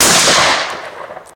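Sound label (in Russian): простой звук выстрела при стрельбе из гладкоствольного ружья в открытом тире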